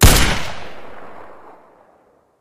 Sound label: fn fal, Guns Fire; Weapons